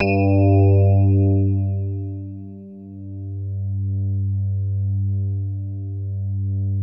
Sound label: E RHODES; Best Service Advanced Media Trax Modern Composer